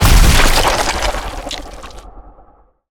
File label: Ability Gooberball Impact; Lurker; Evolve; Gorgon